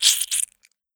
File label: blood